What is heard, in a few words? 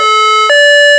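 two tone, siren; vcmod; els; sa-430m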